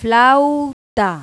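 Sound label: flute; Pipo-Aprende musica con Pipo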